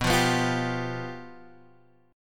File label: F Suspended 2nd Flat 5th B